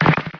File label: Hit